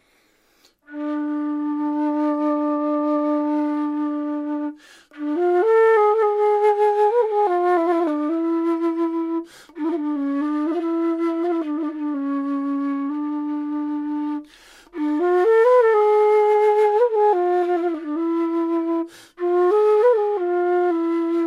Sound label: Gypsy D impro; PVC Flutes